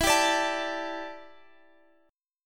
E Minor 11th guitar chord, Open F; Em11; strummed; x,x,16,14,17,16

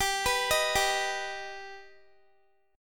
G Augmented G Guitar; arpeggiated

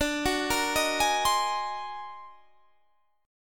D sharp Minor Major 13th D

